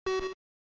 OS Sound Notification

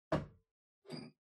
Pendulum Clock Hardware Far Close

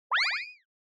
Motion Blow Away Dry

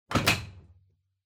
Washer Front Load Door Close Strong

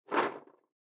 Drawing Box Shake

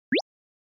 Anime Motion, Mid

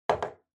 Spice Mill Put

